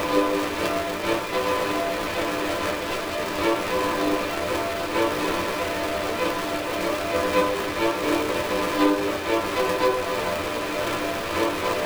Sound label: generated music